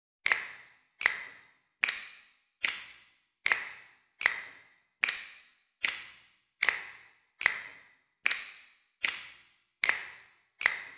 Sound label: Snaps; Mr. Tabs